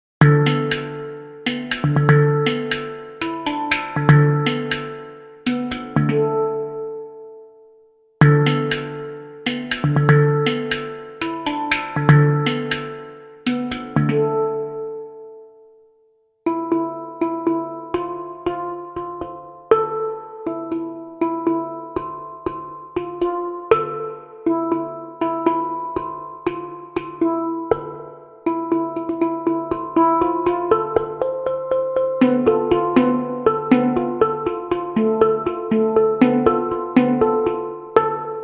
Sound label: aquatic world hang drums